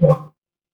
ui low battery

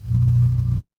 office phone vibrate

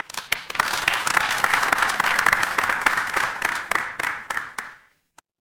crowd applause

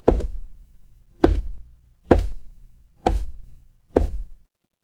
footsteps stairs

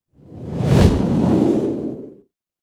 transition whoosh

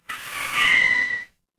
mechanical tire screech

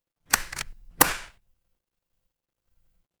office stapler

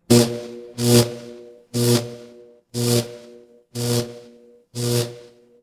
fire electric zap

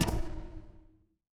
magic scifi shield

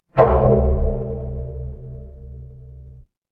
magic scifi alien

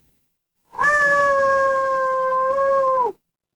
animal cat meow

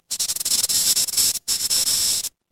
animal insect

